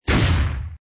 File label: bomb; 弹球音效; tanqiu